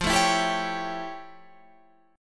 F Augmented Major 9th Guitar Standard; F+M9; strummed

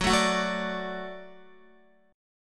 Gb Minor Sharp 5th Gb down Guitar; Gbm#5; strummed; JGuitar

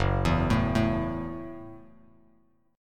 F sharp Minor 7th F sharp, Bass Standard; arpeggiated; JGuitar

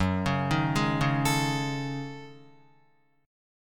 Gb Minor 6th Add 9th Gb down Guitar; Gbm6add9; arpeggiated

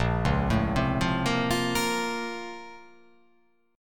A sharp Minor Major 9th

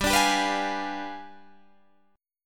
G 7th Flat 9th, Guitar, Standard; strummed; JGuitar

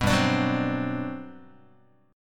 G Major 11th down Guitar; strummed; JGuitar

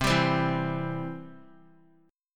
C Major; strummed; JGuitar

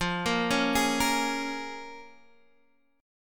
Bb Minor 7th, guitar

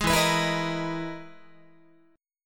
E-Major Sharp 11th E, Guitar

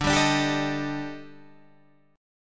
Eb Major 7th Flat 5th D

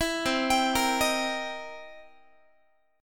C 7th Sharp 9th, C, down, Guitar; C7#9; arpeggiated; 19,20,22,20,19,x; 4A,4E,5A,5D,5G#,6C#

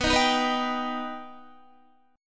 B Augmented B Guitar, chord; strummed

